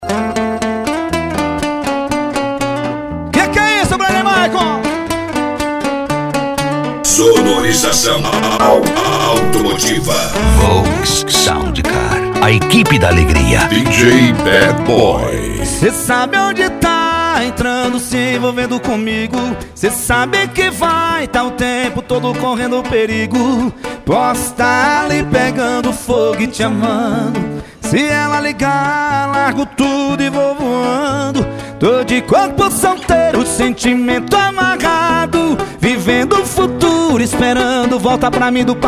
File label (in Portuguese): sertanejo e pisadinha; cd volks sound car